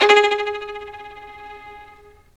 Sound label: STR Violin, Solo Violin FX